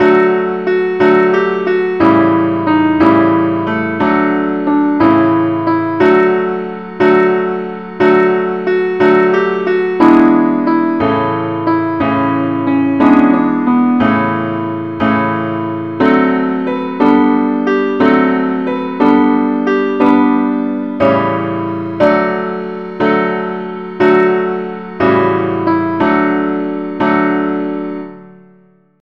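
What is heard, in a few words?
simple accompaniment; key d; angelvoices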